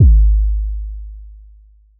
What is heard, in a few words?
sub bass thump one shot; 2 seconds